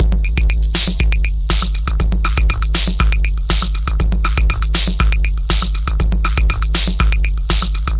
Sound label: 120 clicking and distorting, drum loops and breaks